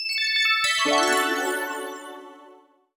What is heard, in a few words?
Sparkly Clean!